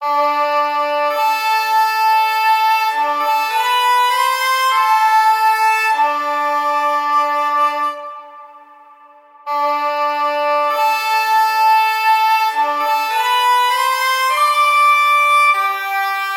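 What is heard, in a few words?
Flute & Clarinet Melody - A minor 100BPM; Construction Kits; STEMS; Ghosthack; SkyRealm; Kit 10 - Windhelm Forest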